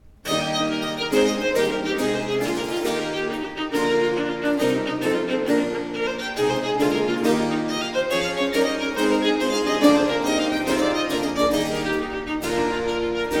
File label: Sonate 7 in F major 4. Giga, allegro; Arcangelo Corelli; Corelli - Complete Works; Klassika